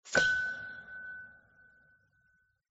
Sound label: note F Sharp